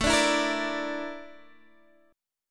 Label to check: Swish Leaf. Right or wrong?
Wrong